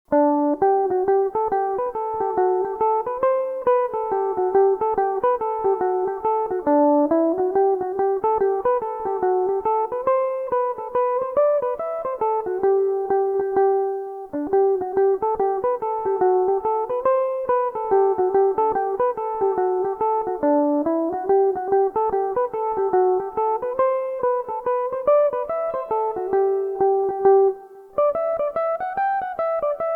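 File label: hornpipe g major, mandolin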